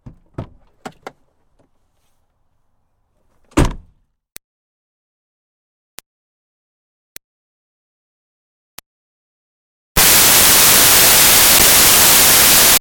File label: Car Smart Car Trunk O-C Hard; 2005 Smartcar Fortwo; Vehicles